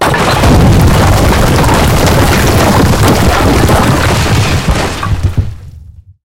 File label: building fall big